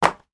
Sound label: household shoes sandals flip flops thongs place down; sound effects one